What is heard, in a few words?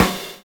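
RAPID FILL; Univers Sons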